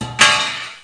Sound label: Metal Clang; How Many Bugs in a Box